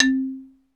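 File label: XYLO, xylophone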